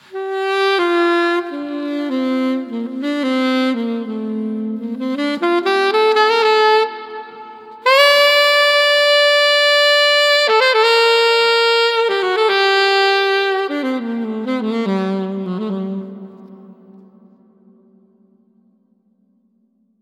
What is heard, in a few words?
Great British Spring - Saxophone; Nevo Studio Springs